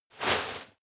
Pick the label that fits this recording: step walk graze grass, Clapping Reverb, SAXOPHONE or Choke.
step walk graze grass